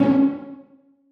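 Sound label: celli pizzicato c4; Sonatina Symphonic Orchestra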